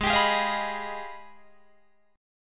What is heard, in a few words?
Ab Minor Major 13th, down, Guitar, DADGAD